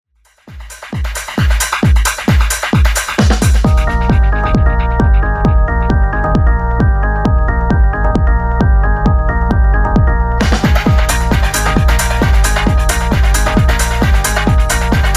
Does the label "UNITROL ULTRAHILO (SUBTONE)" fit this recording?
No